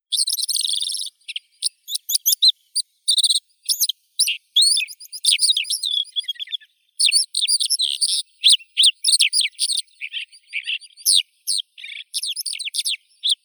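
white-winged lark Melanocorypha leucoptera, nature sounds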